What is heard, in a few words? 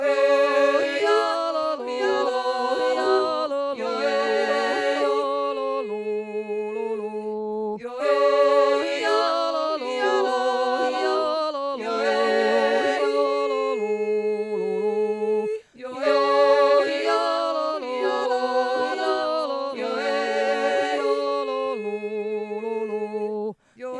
uj jo jo lo lo loop